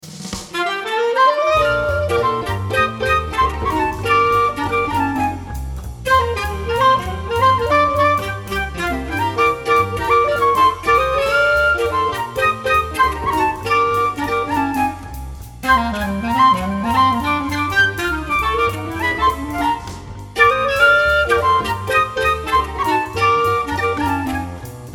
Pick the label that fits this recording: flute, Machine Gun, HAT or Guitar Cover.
flute